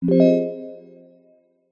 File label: notification digital bell warm negative